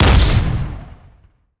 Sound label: mech turtle explo; monster